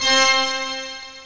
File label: full pipe organ; OctaMED SoundStudio V1; mirage